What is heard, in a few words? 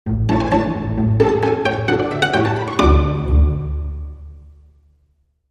Short Sting